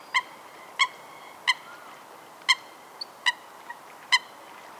foulque macroule

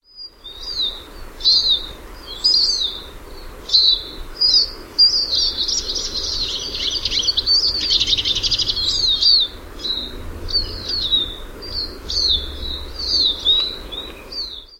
tarin des aulnes

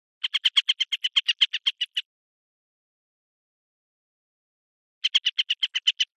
busard cendre

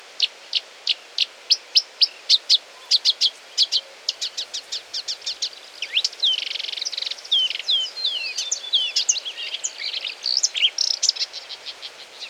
rousserolle verderolle

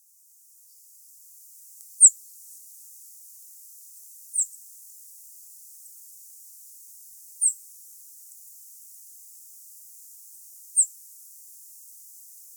bruant fou

